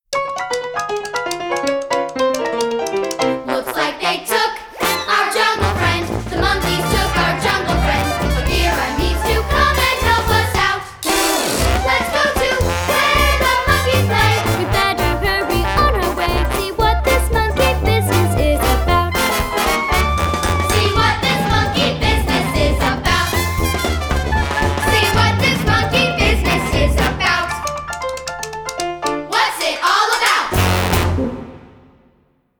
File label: vocals; monkey business